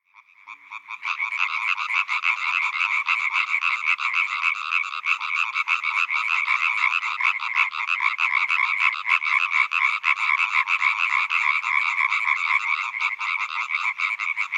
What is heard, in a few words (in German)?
Laubfrosch Hyla arborea